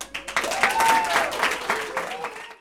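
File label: APPLAUSE; Sexscape 2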